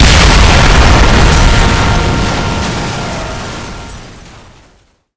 strashnij nekontroliruemij vzriv

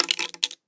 negromkij zvuk padayushih monet

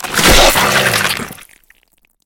napadenie molodogo hishnika na zhertvu

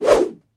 bodrij zamah dlinnim mechom

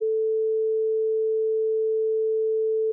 sinusoidalnij testovij signal

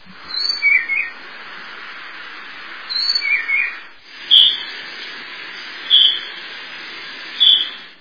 spokojnoe shebetanie vyurka v prirode

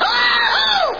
krik

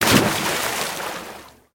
moshnij korotkij vsplesk

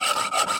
korotkij zvuk raspila plastmassi

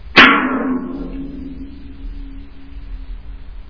stuk po metallu s dolgim zvukom

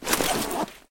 virtuoznie vzmahi oruzhiem

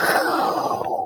ataka paukov dolgij zvuk

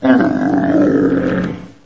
zverinij rik

